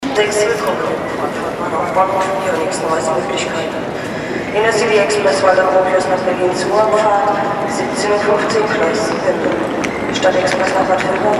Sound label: railway station frankfurt